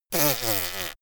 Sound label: little girl snort; soundpool